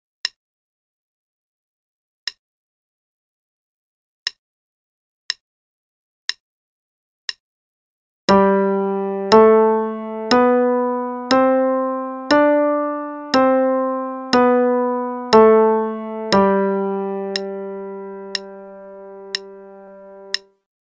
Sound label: 5 Finger Pattern in G (1x, qn=60)